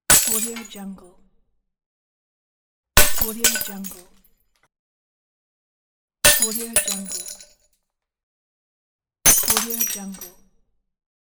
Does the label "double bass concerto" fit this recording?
No